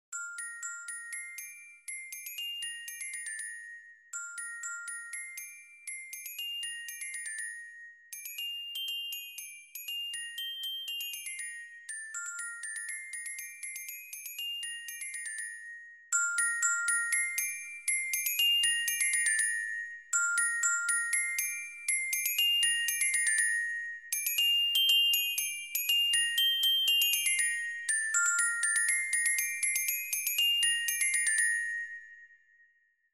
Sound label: Bells, bugle band; Grenadiers